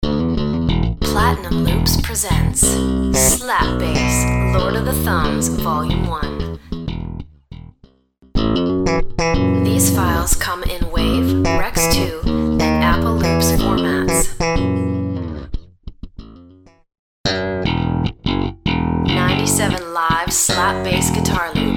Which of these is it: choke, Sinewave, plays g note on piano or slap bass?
slap bass